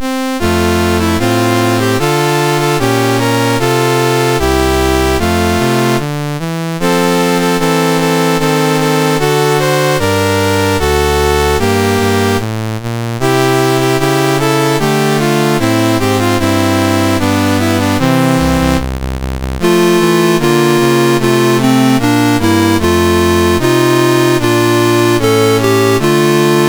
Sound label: MOS6581R4, National Anthems; Rwanda